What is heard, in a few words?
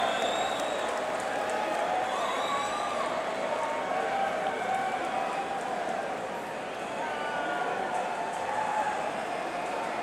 Crowd; Utica, NY; Utica Memorial Auditorium